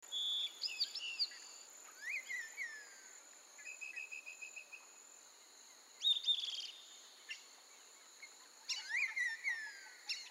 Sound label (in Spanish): fondo naturaleza; mekanta